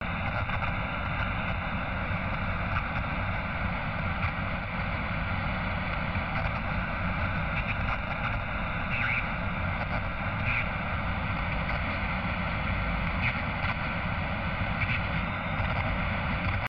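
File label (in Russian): помехи на радио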